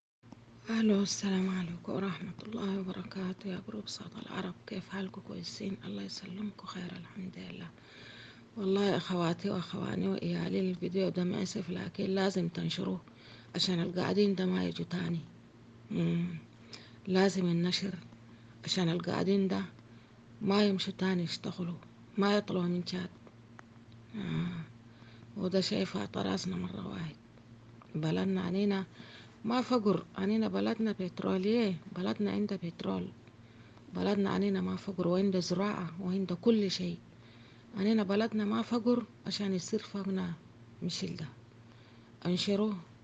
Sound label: Vocal